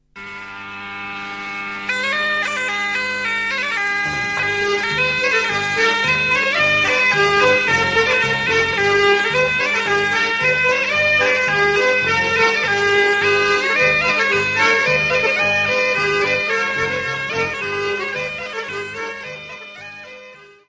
Maclote d'Habiemont, Belgique

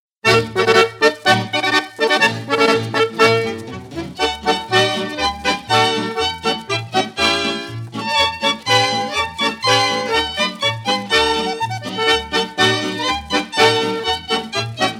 Doudlebska Polka, Republique Tcheque

